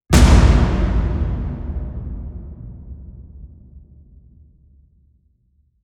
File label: aqua hit big